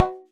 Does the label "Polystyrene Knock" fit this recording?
Yes